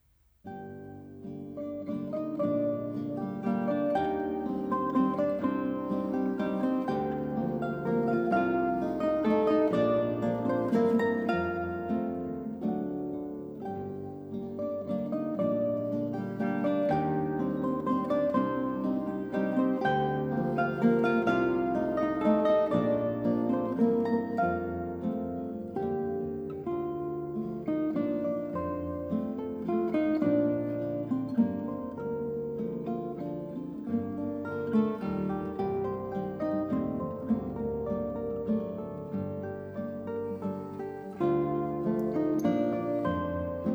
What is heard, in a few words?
Waltz in G sharp minor, Guitar